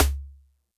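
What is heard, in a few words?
Xylo Snare DX100 C sharp 7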